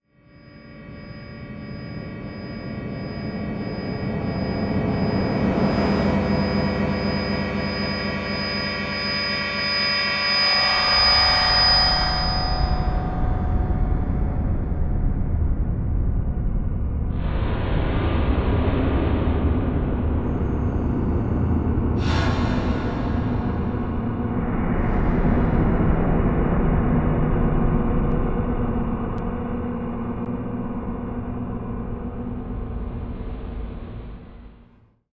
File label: Reverse Intense Low Decay; Module Production Element Title Imaging; Imaging Elements